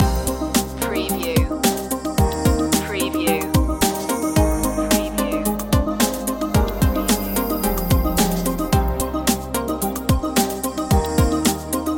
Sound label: computer network loop